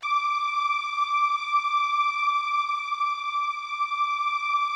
D5, STRINGS, Yamaha VP-1; Music Store; JODYSTRINGS

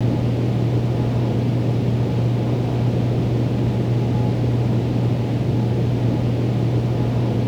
room tone

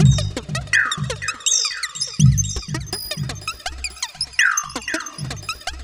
Subtractive Beat

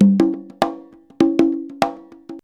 CONGA, Modern Percussion Loops

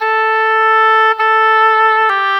Oboe, Orchestral, Rock Pop; Sonic Foundry (Sony Creative Software); Orchestral Series VOL-4 - Rock and Pop

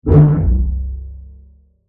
whale shot

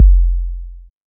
808 Kick; Novation Drumstation; Novation DrumstationV1

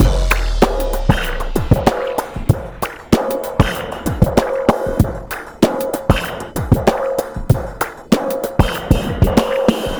DRM 096, Breakbeat

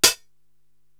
HH Chunk Close, Drum Machines; Korg TR-Rack

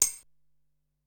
Tambourine, Ensoniq SQ-R Plus

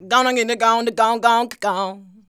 "ganagege", shouts; Best Service; Hyper Dance

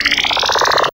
FX Dance; Sound & Vision - Gigapack II

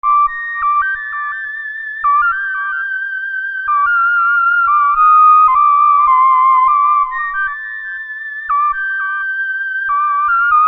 Keyboard, Soul; Keys; Sonic Foundry (Sony Creative Software); Infinite Soul VOL-1

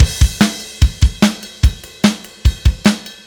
Silos Beat Crash, Indie Rock; Sonic Foundry (Sony Creative Software); Indie Rock Anthems; Session 11 - Silos

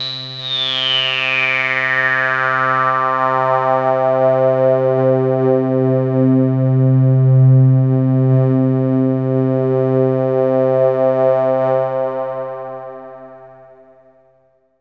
Long Reso Sweep; Trance Formation

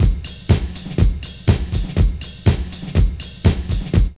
DISCO LOOP; Zero-G; Funky Elements

